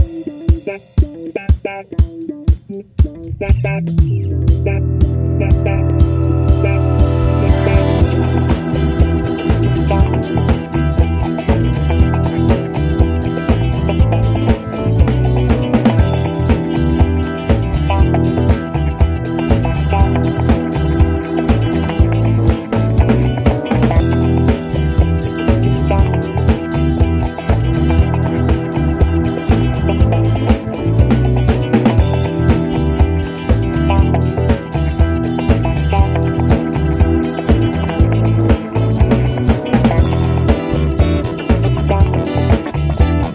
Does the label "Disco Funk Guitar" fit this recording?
Yes